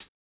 Hat Hit; 080 Cmin; Hip Hop Exotica; Big Fish Audio

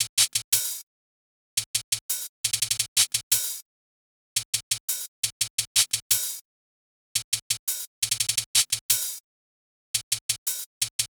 Hats, 086 Emin; Hip Hop; big fish audio; Hip Hop Exotica; Apple Loops; Drum Tracks

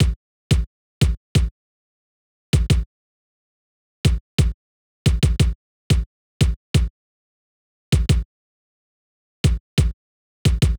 Kick A, 089, Cmin, Drum Tracks

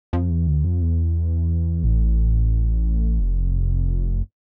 Bass, Hip Hop Exotica, 088 Emin

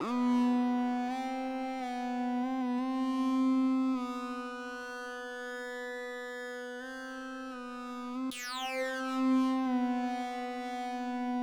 Synth, 084 Gmin; Hip Hop Exotica; Big Fish Audio

